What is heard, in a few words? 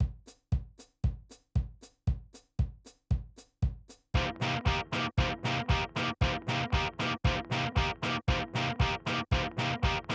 guitar solo; 7 takte cm7